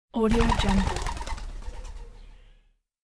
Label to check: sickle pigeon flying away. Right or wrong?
Right